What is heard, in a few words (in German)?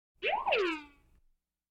ufos vorüberfliegende weltraumpartikel; Science Fiction